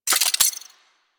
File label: Pistol Alt Fully Charged; weapons; Warframe; Thanotech